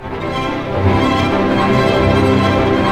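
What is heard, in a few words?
strings arpeggio major, symphony orchestra; Roland LCDP08; HIT Major Hits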